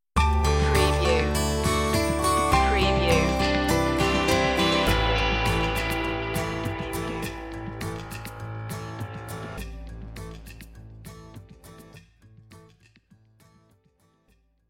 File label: ocean breeze stinger